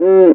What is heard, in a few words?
CUICA LO, GM DRUM SET; AKAI S-Series CD-ROM Sound Library VOL-8